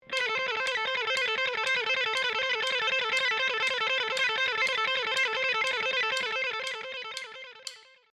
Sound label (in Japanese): ereki picking